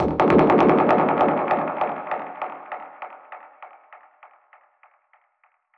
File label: Perc Hit, dub percussion one shots; musicradar